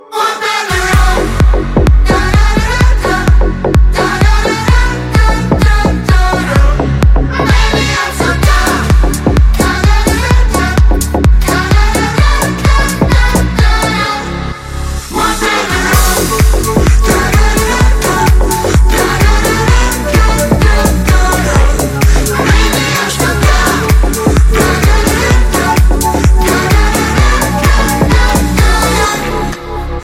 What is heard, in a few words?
organ run